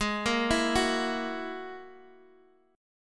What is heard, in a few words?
G sharp 7th Suspended 2nd Sharp 5th, Guitar, Open D; down; chord shape